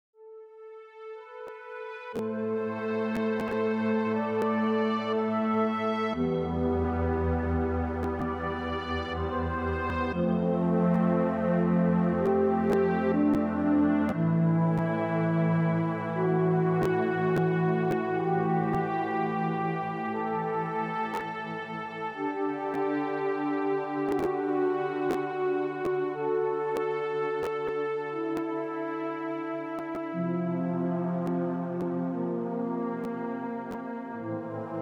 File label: reason pad